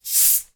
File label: Soda Open